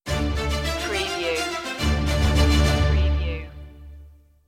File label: the chase stinger, oboe